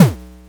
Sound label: Kick Dirty, Lo Fi Drum; Kicks